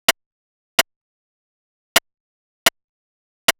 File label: Drum Loops, 128bpm, Berlin Techno